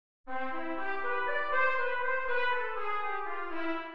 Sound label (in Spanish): Maj7 inv0 en C; Boca de Lopez